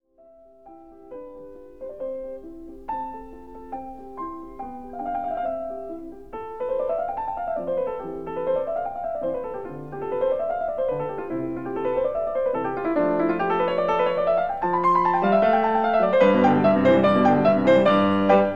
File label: Piano Sonata In C Allegro; Mozart; Piano Sonata 15; K 545; Sonata Semplice